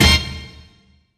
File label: orchestra hit